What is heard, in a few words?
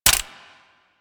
Gunblade Mech, Weapons